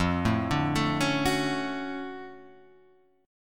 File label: D-Major 7th F; DM7/F Chord {1 0 0 2 2 2} arpeggiated